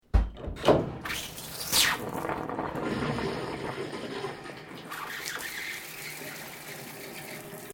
Shower System Japanese Water Lever Activate, Japanese bathroom appliance; Household